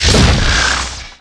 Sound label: bolt